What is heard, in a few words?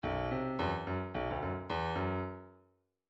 midi grooves funk